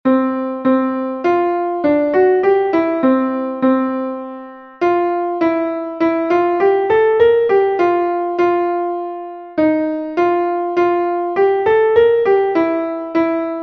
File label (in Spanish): CONTRALTO, himnos en piano; cantos del camino